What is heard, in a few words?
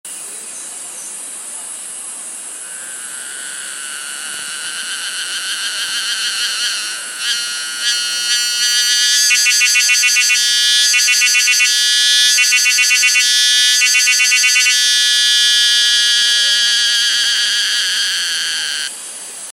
Emeishan whistler